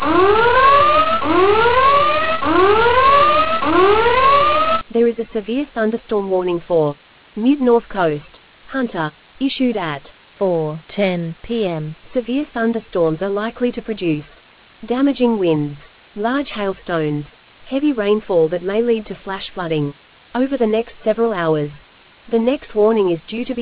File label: storm audio